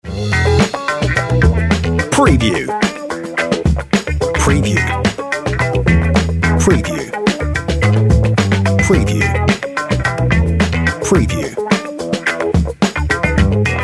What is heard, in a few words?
On Hold Music